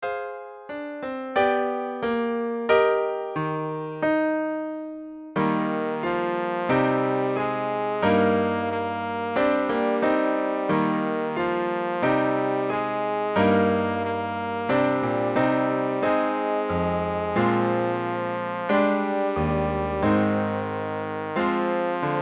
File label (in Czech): zasneni pozoun klavir; trombón + klavír; Zasnění - M.Krasev